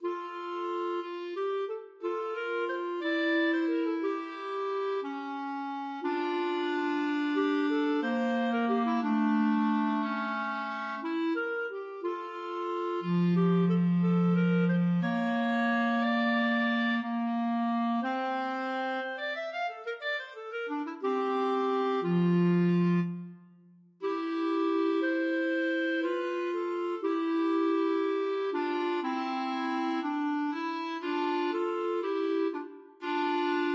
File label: clarinet duet